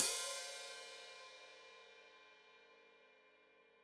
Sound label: Hat Foot Open, Hi-Hat; Deeply Sampled Drums